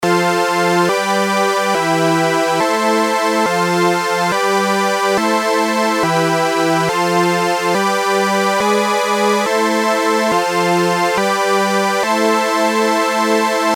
シンセ音作りコード進行

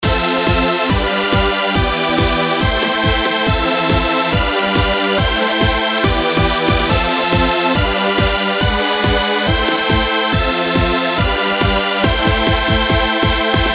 シンセ音作りサンプル素PAD

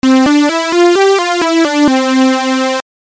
シングル ソー デチューン

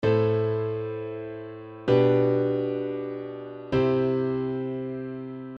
6-5.7-1 進行

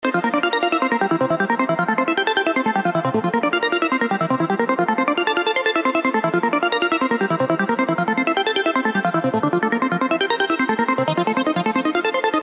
アルペ 設定前

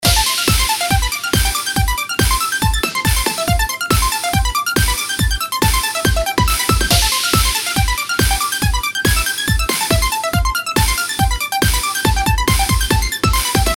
シンセ 音作り サンプル アルペジオ ミックス

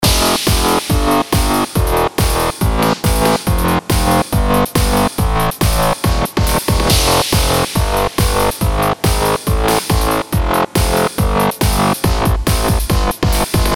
シンセ音作りサンプルベース SAT

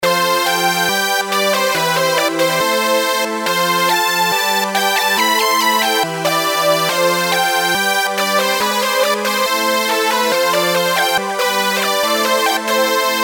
シンセ 音作り サンプル リード

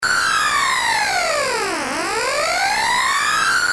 四角波 ＦＭ

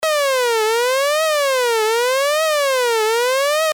lfo三角波

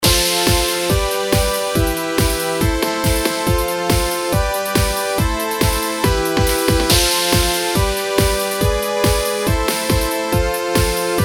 シンセ音作りサンプル attack pad

